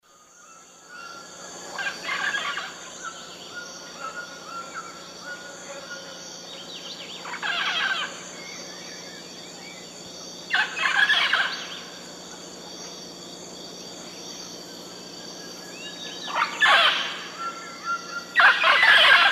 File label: Amazona farinosa, flight calls; S. America; Eastern Lowlands